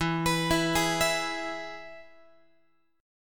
E-Major E, Guitar; arpeggiated; JGuitar

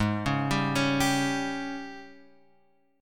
Ab Major 7th Suspended 4th Ab down; arpeggiated; JGuitar

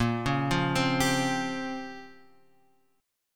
A sharp Minor 6th Add 9th; A#m6add9 Chord {6 4 3 5 X 3} arpeggiated

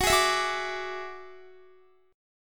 Gb 7th Suspended 2nd Sharp 5th Gb down Guitar; strummed

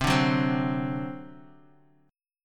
C Suspended 2nd Flat 5th C down

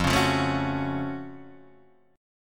Gb Minor Major 7th F

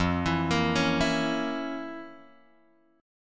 F Major 7th Suspended 4th Sharp 5th; FM7sus4#5 Chord {1 X 2 3 2 0} arpeggiated

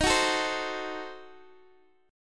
Eb Diminished 7th, Guitar Open G; x,x,13,11,10,10; down

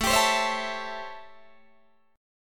A 7th Sharp 9th, Guitar, Open D

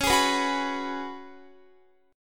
Db 7th Suspended 4th Db down Guitar; strummed

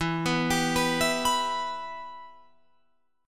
E-Minor, Guitar, Open F; arpeggiated; 16,18,19,18,19,18

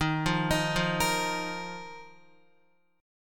Eb Suspended 2nd, Guitar; Ebsus2 arpeggiated; JGuitar

